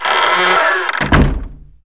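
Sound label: door close; Redneck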